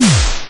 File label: knockback